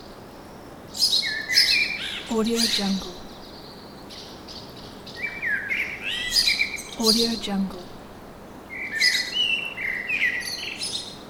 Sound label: urban city backgrounds